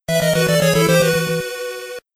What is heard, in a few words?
miss, death sound; rondo of blood